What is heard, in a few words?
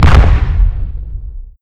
Blast; openclonk